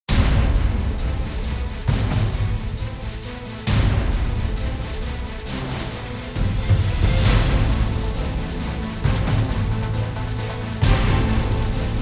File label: BGM country; Different Dimension Lord; Android